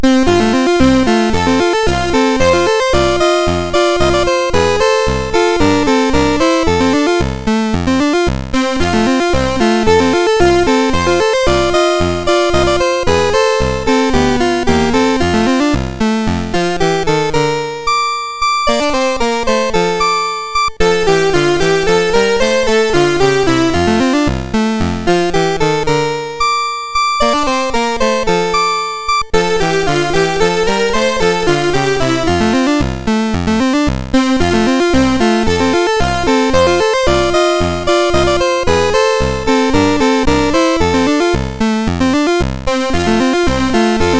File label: MOS6581; MOS6581R3